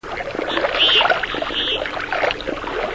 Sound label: bucephala clangula